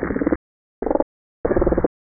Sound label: White spotted damselfish; Bertucci